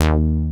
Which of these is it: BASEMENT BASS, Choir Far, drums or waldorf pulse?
BASEMENT BASS